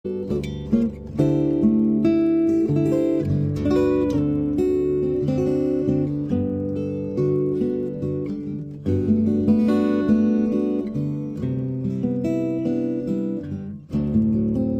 Hawaiian Slack Key Guitar, It Came Upon A Midnight